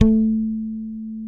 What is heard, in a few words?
SADWSKY5MUTE, Bass; Sampleheads; Will Lee Bass Library